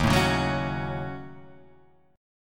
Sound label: D sharp Minor 7th Flat 5th D sharp down; strummed; JGuitar